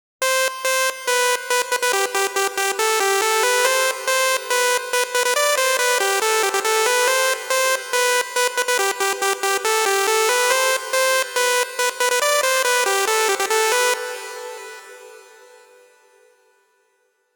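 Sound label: 140 BPM Melody no sidechain; Vengeance - Essential Clubsounds Vol.5; Demo Constr Kits; Nessi Kit Root A; Synth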